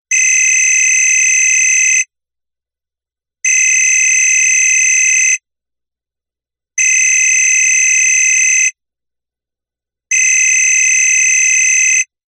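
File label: Telephone 4 Rings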